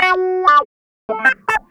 Clavinet; Ambient Grooves

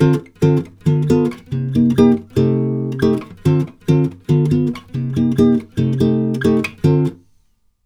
CHORDS, Brazil Bossa; AKAI; USB Soundscan vol.16

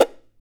CHARANGO FX; Guitars; Spectrasonics; Hans Zimmer Guitars VOL-2

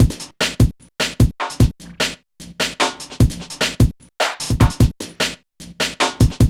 619 GROOV, Boom da Drumz, Grooved; E-MU Producer Series Vol. 6 – Analogia Project

